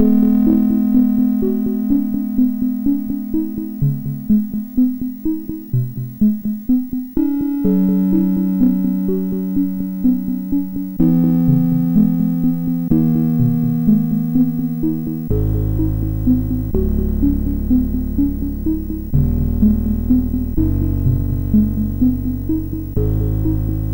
sid, MOS6581; Rust outro; MOS6581R4

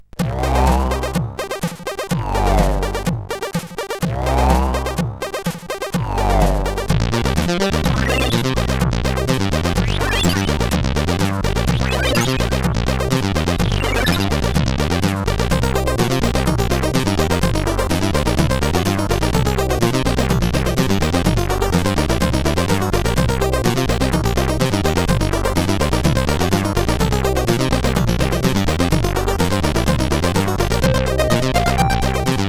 sid CSG8580R5

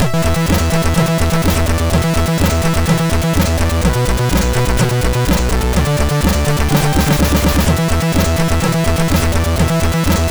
Digi Box, MOS6581R3; Sony; Digi Box 7

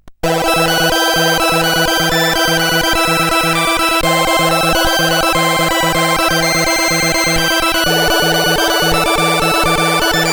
music, sid CSG8580R5

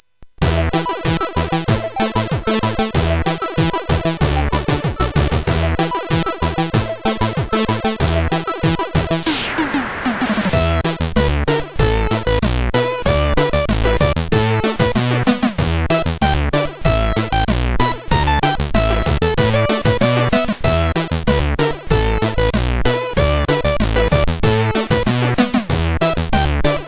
disco bass, CSG8580R5

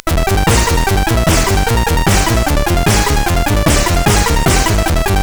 loop, SID CSG8580R5; Shitloop 3

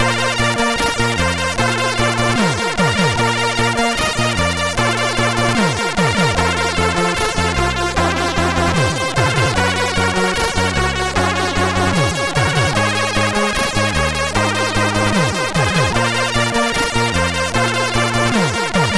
Fast Music, MOS6581R4; Dunn Jonathan

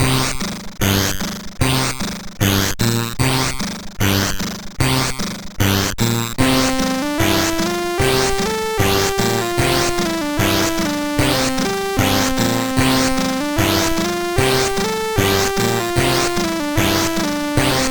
MOS6581R4

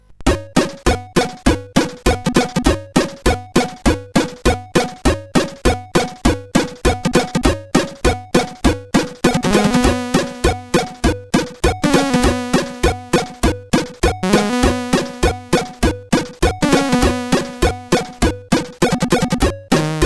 intro sid CSG8580R5; Blues Brothers Mission

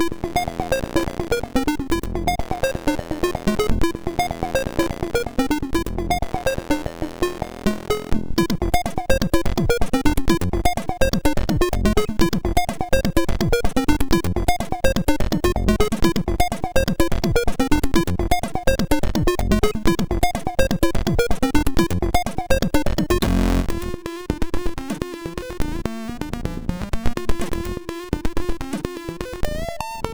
sid, MOS6581

